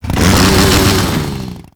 Saw Accent Rev; melee; weapons; Warframe; Grn Ghoul Saw Player; Kuva Ghoul Saw

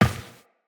Step Glass, Footsteps Walk; Characters; Tenno

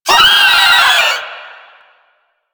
Volatile Runner Kamikaze Scream; Enemies; Abilities; Warframe; Proto Infested

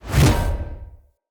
Spear Whoosh; Weapons; Enemy; Spears; NimrodSpear

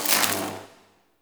Elec Spark; Ambience; Props; Warframe; Nokko Colony

